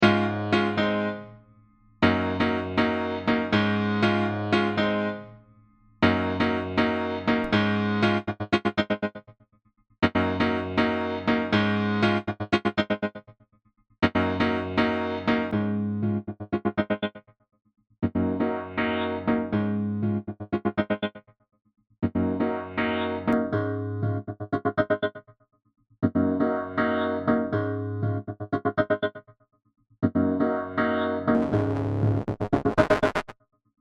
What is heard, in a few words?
steinway